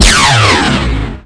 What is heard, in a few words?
PLASMA